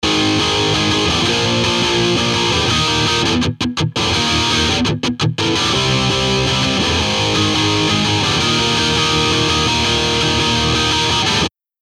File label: Chorus Flanger Case 4 Before